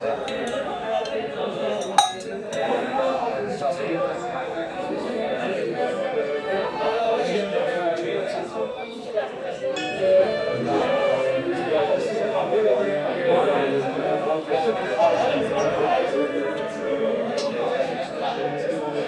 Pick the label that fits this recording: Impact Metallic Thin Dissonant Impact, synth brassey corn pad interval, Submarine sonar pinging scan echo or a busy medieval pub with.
a busy medieval pub with